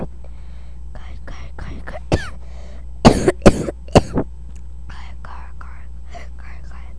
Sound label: car